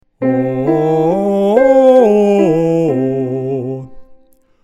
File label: Major Arpeggio vowels